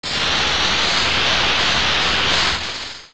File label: Sparkle; Ganondorf